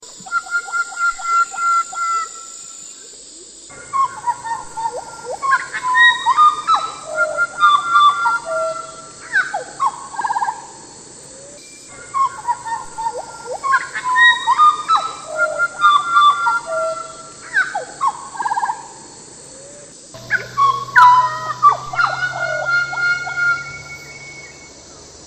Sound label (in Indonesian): jagal papua